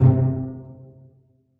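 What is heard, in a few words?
string pizzicato C2 mezzo forte left; Sound On Sound - Peter Siedleczek's Orchestra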